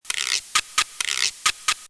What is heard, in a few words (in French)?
succes etape